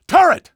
turret

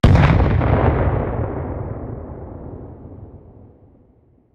hegrenade distant detonate; arccw go

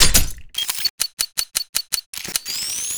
sensor arm, sensor grenade; arccw go

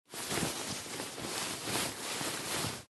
bed sheet movement

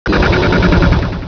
attack; hl1; archer

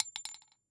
casing 9mm; arccw; weapons

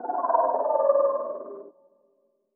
chirp, protozoan; hl1 npc

